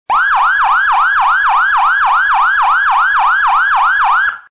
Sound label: PA-300 Yelp